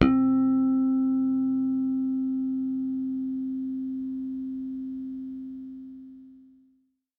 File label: tarmonic, Guitar; Notes